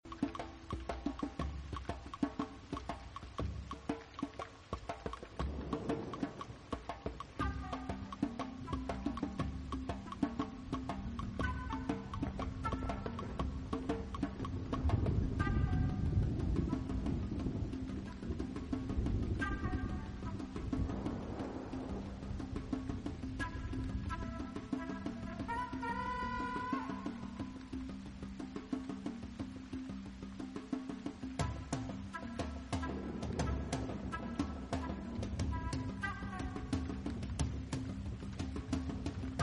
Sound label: Thunder Drums